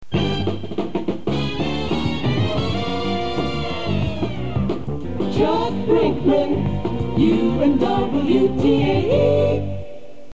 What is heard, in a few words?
you jingle